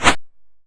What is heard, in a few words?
YM Perc, DRUMS; ChipShop Deluxe; ChipShop Hits